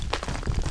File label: rocks; Tanks